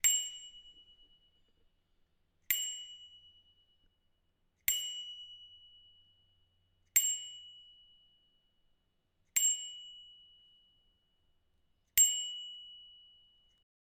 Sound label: Bicycle Bell Int Ringing Bike Bell Once